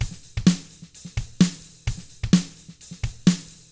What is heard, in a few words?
HRDRK, hard rock drums